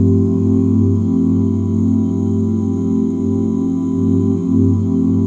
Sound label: OOH G MIN9, OOH CHORDS, Vocal Jazz; ILIO; Vocal Planet; Jazz & FX